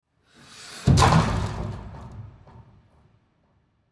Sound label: Hockey Check, Hockey Check Into Boards Glass Body Slam; SoundEffects-Blastwave; Sports